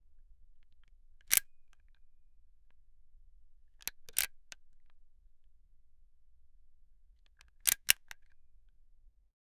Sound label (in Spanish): palanca siendo accionada, switch